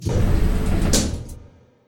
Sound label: DOOR CLOSE; Dino Shift 2